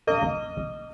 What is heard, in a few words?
piano chord